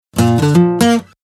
Guitar; BlindShell; BlindShell-Classic-2

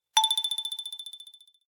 Drop

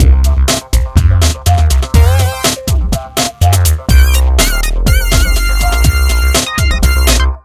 music urban rhythm, ringtones; Sony Ericsson; A8i; cmcc

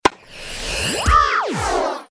bounce

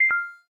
cam stop

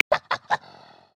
Asian Badger; Xiaomi Redmi-Note-8-Pro; South West Mountain

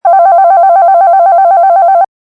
DX7 Shimmer, Rings

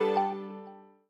Lock

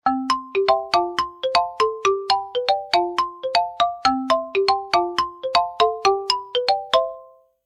Call of love, ringtones

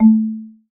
Marimba, notifications

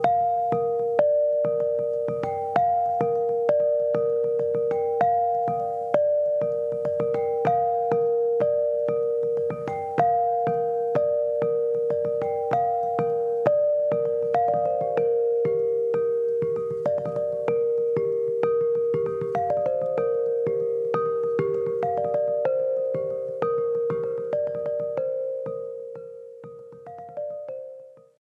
ringtone cymbals; Mudita Pure

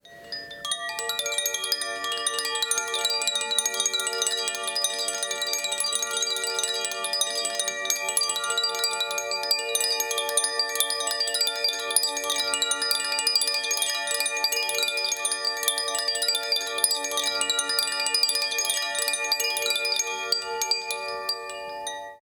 ringtone koshi; Mudita Pure

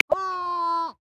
Galapagos Penguin; Xiaomi Redmi-Note-8-Pro

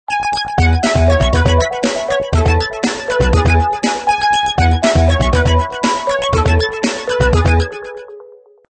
Robot Groove; Sony Ericsson; Z520i

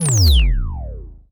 swing down start laser; LG Wing 5G Verizon

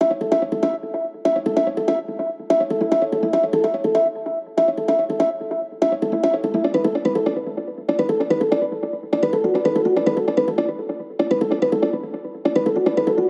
system ringtones abstract; Amazon Fire Phone

